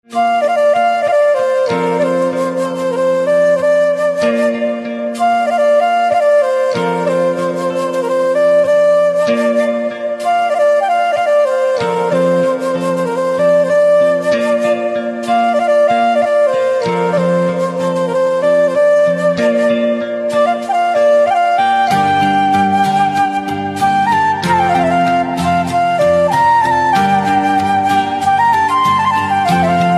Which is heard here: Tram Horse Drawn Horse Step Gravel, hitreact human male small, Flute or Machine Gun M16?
Flute